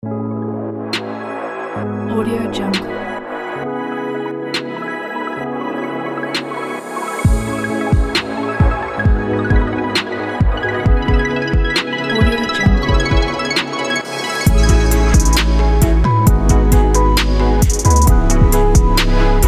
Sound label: fashion chill rb; deepersound